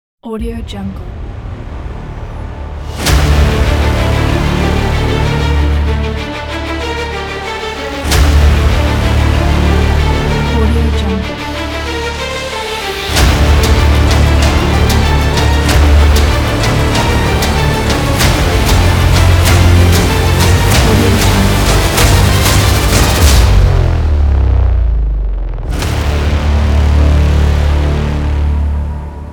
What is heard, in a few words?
teaser trailer